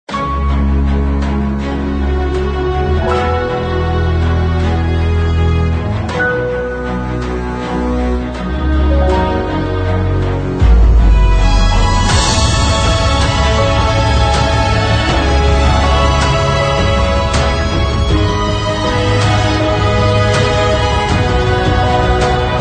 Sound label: Epic